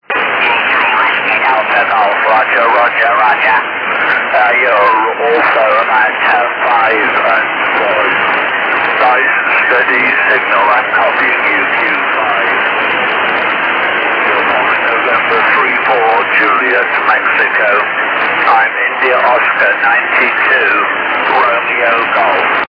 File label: k band downlink; AO40